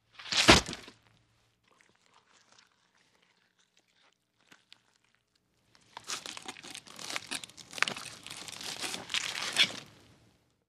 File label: Spear & Knife Into Body, Spear Jabs & Turns In Body, Long.